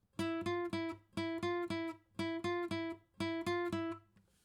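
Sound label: acoustic pattern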